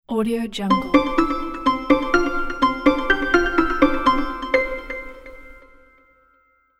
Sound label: gentle symphonic introduction logo